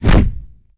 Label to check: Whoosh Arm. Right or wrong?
Right